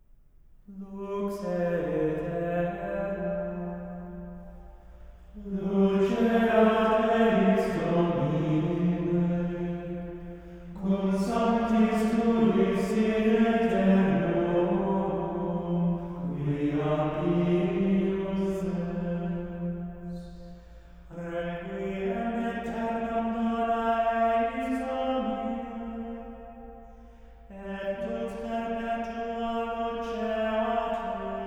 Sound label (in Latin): Communion antiphon Lux ætérna, Greg Chant; Requiem (FSSP)